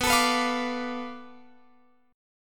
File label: B-Minor 7th Flat 5th B down Guitar Open F; strummed